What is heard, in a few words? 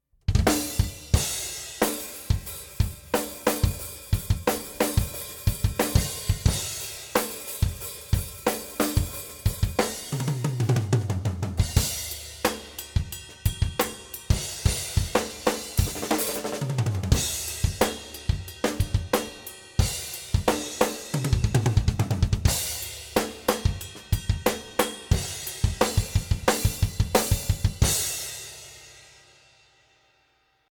Drum Freestyle